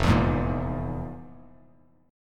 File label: F Minor Major 7th Double Flat 5th, Bass Standard; FmM7bb5 strummed; JGuitar